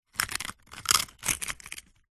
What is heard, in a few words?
egg shell movement crack lightly; zapsplat